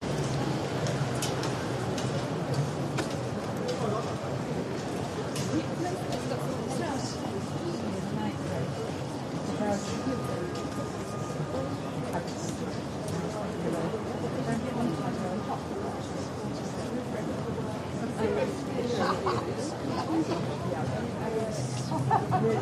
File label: atmos protest quiet gathering walking along; london music mixing